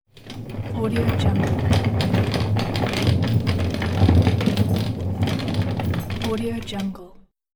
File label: janitorial cart rolling